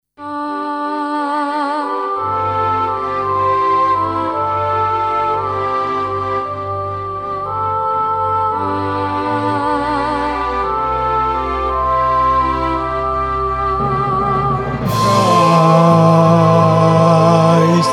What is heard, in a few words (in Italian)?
contralti; O Holy night